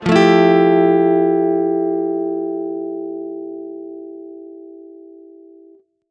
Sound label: Guitar F9maj; One-Shots; Ghosthack; Guitar Loops & Shots